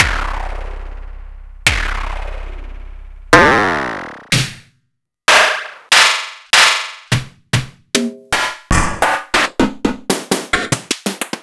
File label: Snare c